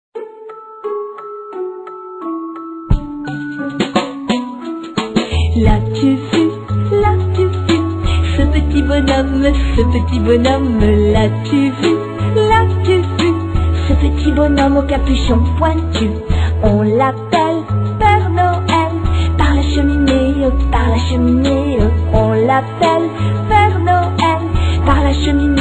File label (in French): femme musique; comptines